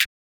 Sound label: pluggnb clap; drumkits; maily; fl studio; maily plugnb